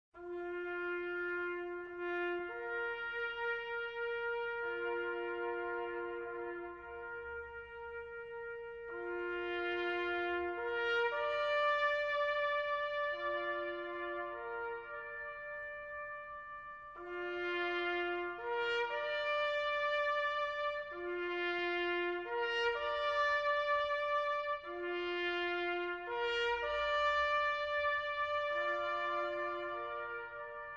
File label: Echo Military Taps